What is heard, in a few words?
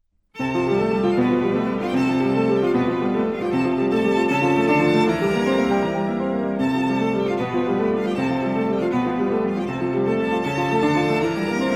piano trio; volkmann